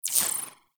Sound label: Briefcase Launcher Aim Enter, Weapons; Warframe; Corpus